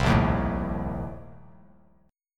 Bb Major 7th Suspended 2nd Suspended 4th, Bass Standard; 8,1,1,2; strummed